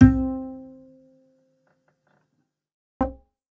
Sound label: bass acoustic